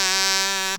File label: Kazoo la